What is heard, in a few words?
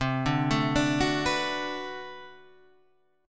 B Minor 7th C, chord